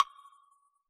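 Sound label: wood block high; AnlgKit1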